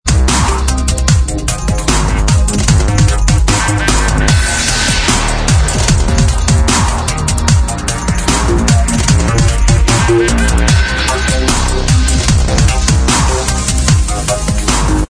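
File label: dubstep remix instrumental track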